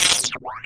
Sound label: glitch